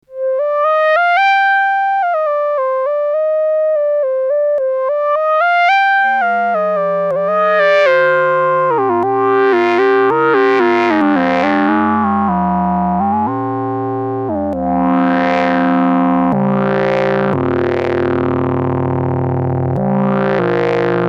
Mini Korg 700S Solo

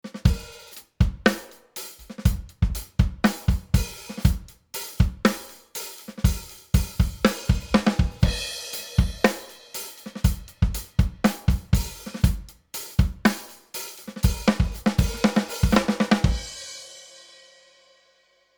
Drums Slow SC 110 Hz; Rockruepel Comp.Two V2

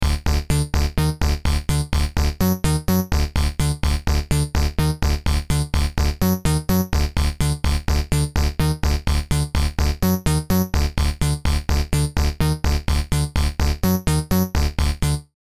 Stylophone Sampler Seq Multi VCO

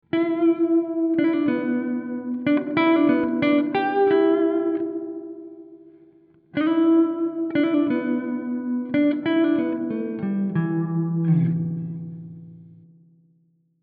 Guitar Lick 2 Springs Brighter Mid Decay Mono; U-He Twangstroem

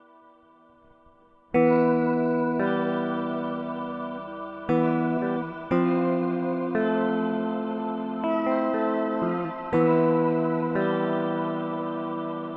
Mooer A7 Shimmer

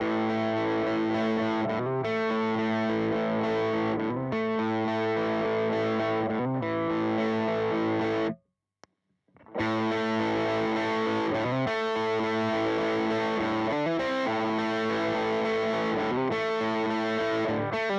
Overdrive Stacking Booster Overdrive

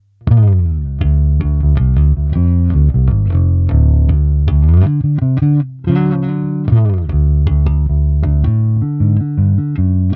Clean Cabsim

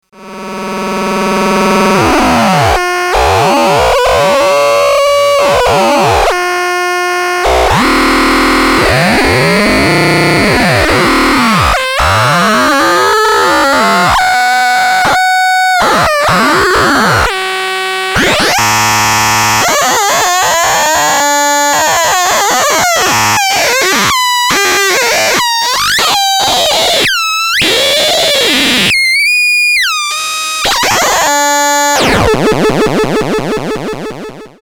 crossmod

